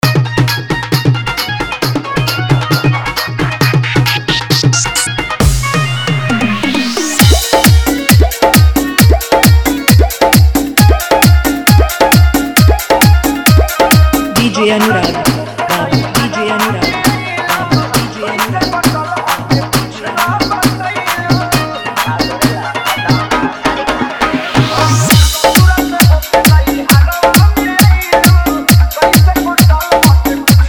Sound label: Faadu Bass Dance Mix